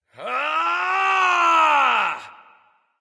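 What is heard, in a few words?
nonverbal battlecry; smite; voicepack; ullr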